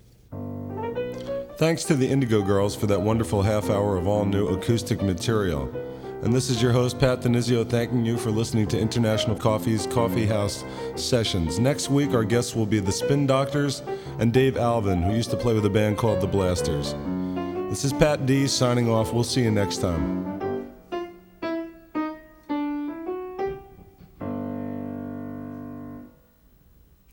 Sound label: outro